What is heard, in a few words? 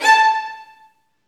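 STR, Roland String Master Series, Violins Marcato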